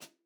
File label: HI HAT FOOT FOOT HI HAT; Sonimus; n series drum pack